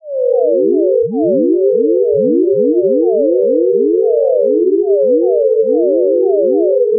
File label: glissons converge